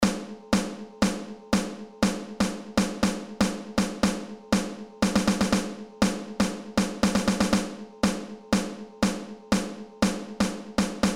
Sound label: Rhythms Snare Drum